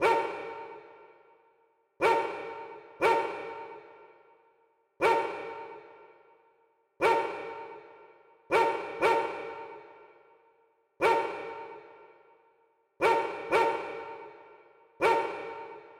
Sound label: dog bark sound in big room